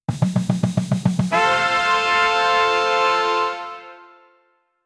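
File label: cheerful and energetic, brazilian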